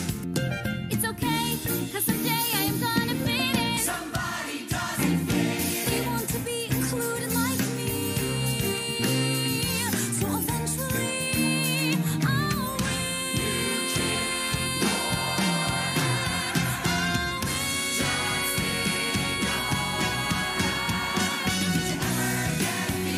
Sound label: Vocals; Mean Girls